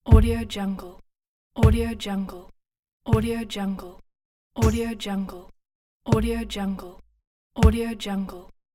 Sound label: stomp